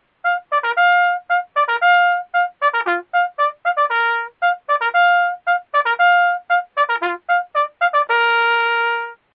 Bugle Call Adjutant's Call

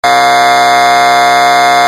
Siren Tone AIRHORN

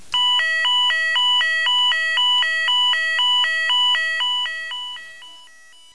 Tone Alternating 990-650 Hz at 2 Hz (250ms-250ms); Symphoni Tones

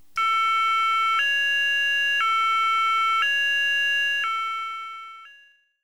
Alternating 554-440 Hz at 0.5 Hz (1s On-1s Off)

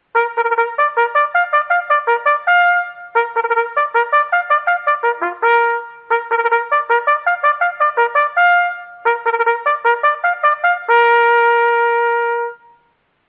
Bugle Call Guard Mounting

